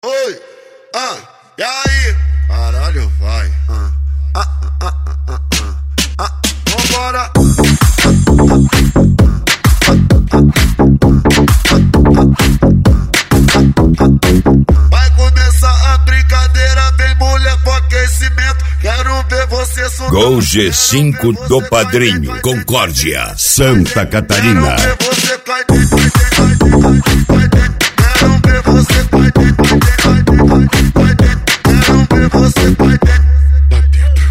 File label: MegaFunk Funk